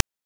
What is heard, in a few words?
PITCHED NOISE; NES DPCM; MIDINES